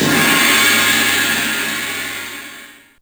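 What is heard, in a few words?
DRUMTRAKS crash, Drums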